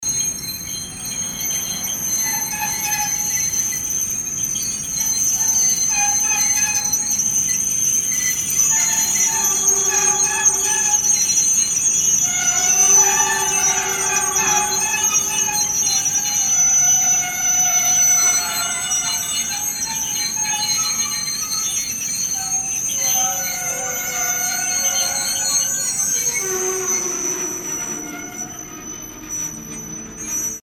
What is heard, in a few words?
train stops